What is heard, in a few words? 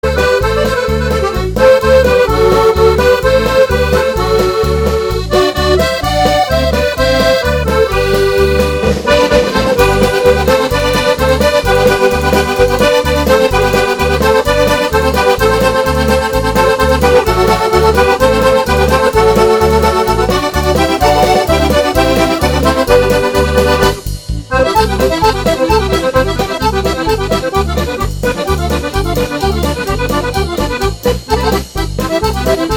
Polka; Valtaro